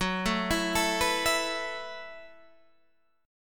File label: A Suspended 2nd, chord; Asus2/F#; arpeggiated; JGuitar